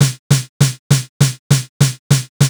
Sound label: drum